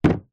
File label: household plastic bucket empty set down on wood floor